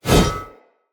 Whoosh, Swords; Weapons; Mockery; Mockery Stultion

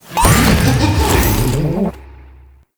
Balloon Mine Charge; Warframe; Follie Frame; Abilities

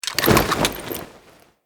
Idle Screen Foley Weapon; Kuva Lich

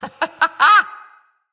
Laugh; Fable Of Garren Rood; Deora

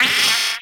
Amalgam Moa Vocal Pain

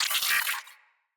Space Drone Death; Corpus

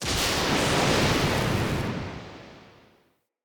Super Jump; Characters; Enemy; Nimrod; Abilities

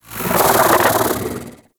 Bug Vocal Alerted; Characters; Warframe; Hunting Critters; InfKDriveBug

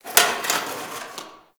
Box Of Trash Rustle

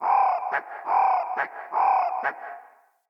Forest Open Glade Animal Far; Day

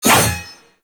Sword Whoosh; Warframe; Teshin; Weapons

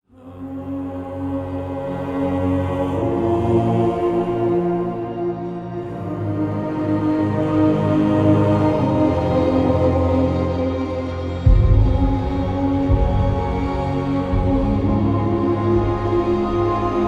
Pact Choice Confirm And Tent; New Player

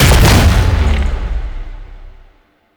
Corpus Projectile Explosion; Gameplay; Warframe; Jade Shadows; Ascension

